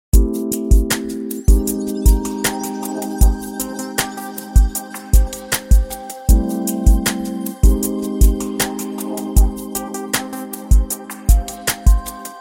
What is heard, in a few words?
Jazz